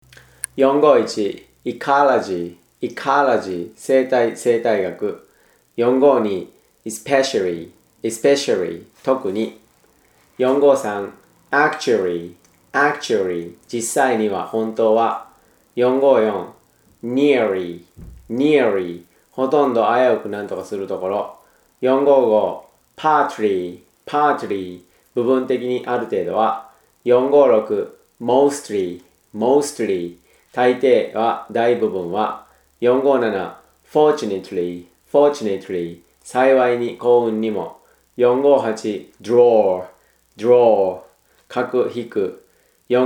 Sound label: tango